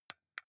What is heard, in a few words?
console controller xbox arrow key press slow